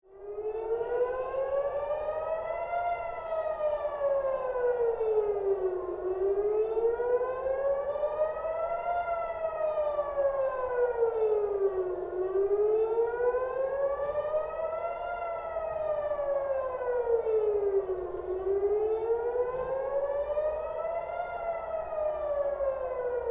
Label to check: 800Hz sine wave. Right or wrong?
Wrong